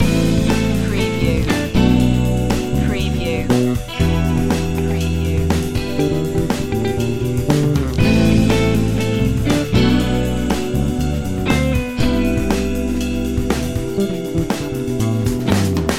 funky strut loop

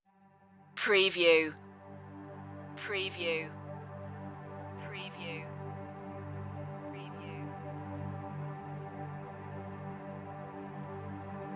no clarinet

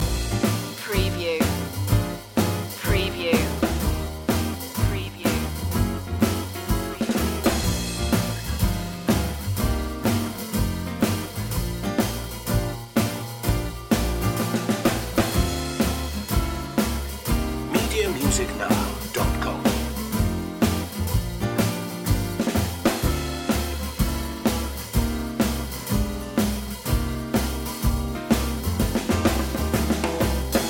hop loop

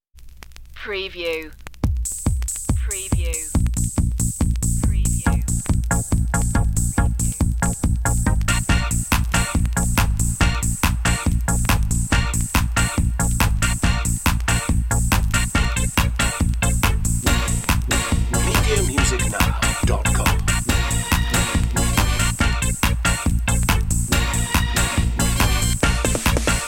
short vintage dance cue

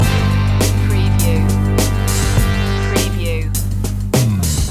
soul beat loop middle end

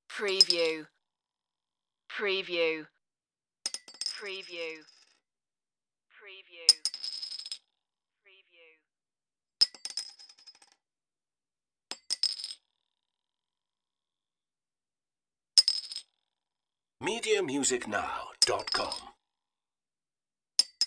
crown cap drop

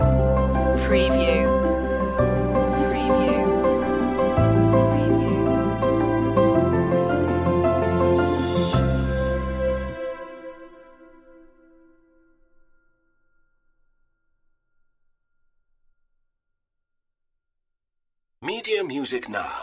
river of reflections stinger

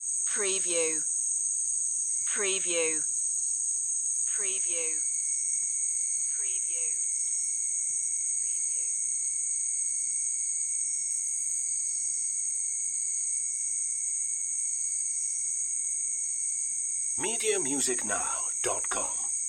night crickets

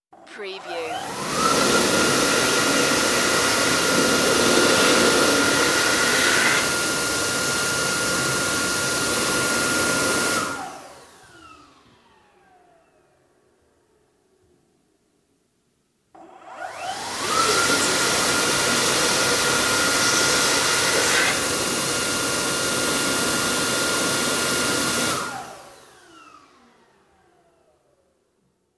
hand dryer

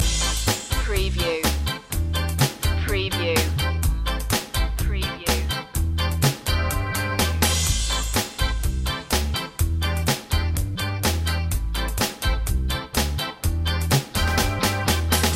rainy day ska loop b

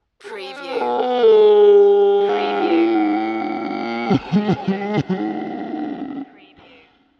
Ghostly Sob

